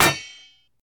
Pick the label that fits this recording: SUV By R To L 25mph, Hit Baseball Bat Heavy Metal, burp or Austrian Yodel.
Hit Baseball Bat Heavy Metal